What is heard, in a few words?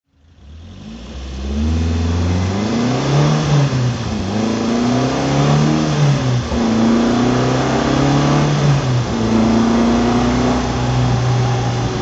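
alfa romeo 1600 spider tuned drive, engine sound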